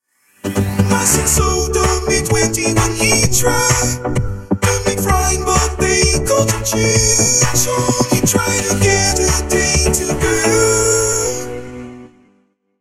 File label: some dumb music